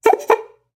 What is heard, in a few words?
Bycicle Horn; SoundsCrate